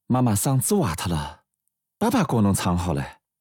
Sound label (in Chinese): 教唱歌